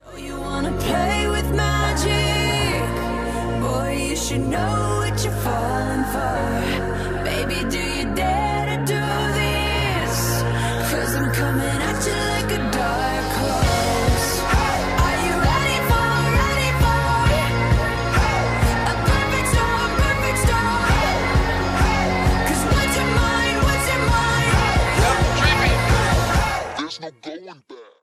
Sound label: English Ringtone